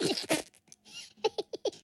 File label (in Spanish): enemigo; skibiditoiletattack